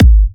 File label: 128BPM Kick; Vengeance Samples; VFH1 128BPM Crowded Kit